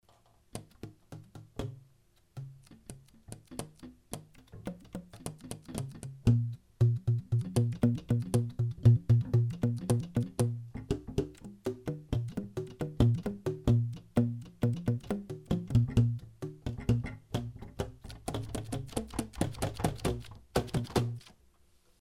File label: Tenor Key Pops